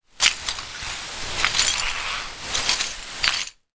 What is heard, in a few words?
glass broken in plastic bag drag on ground; zapsplat